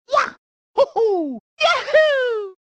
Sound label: jumping; mario 64